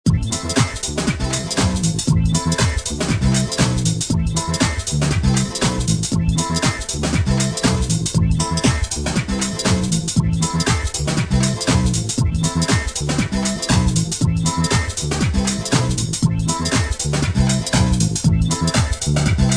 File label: groove